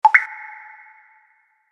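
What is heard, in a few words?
drip, alcatel phone sounds; Рингтоны, звуки будильника, уведомлений и системные сигналы смартфона Alcatel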